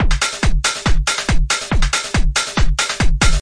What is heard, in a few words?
140 bpm disco, drum loop; 131 to 140 bpm; samples-ghost